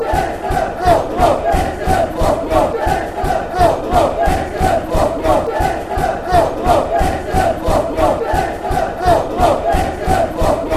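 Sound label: get em hufc, football chant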